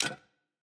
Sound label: Safe Stop